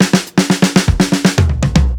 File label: SUPRA 120 FILL, drums; Basement drums vol 2